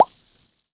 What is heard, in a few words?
Bouncy Shield Burst; Subway Surfers City; Android, iOS